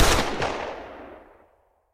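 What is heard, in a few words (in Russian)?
пробный выстрел на учениях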